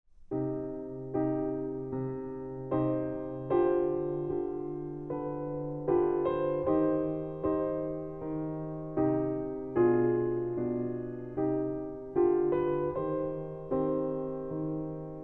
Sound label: piano; gluck; ah dans ce bois tranquille